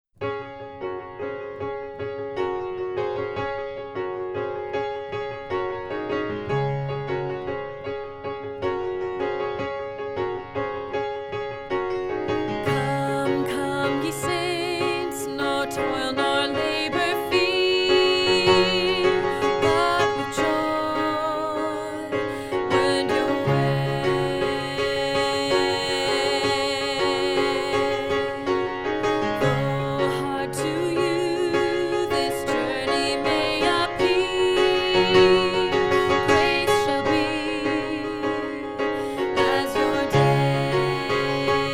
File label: piano version; come come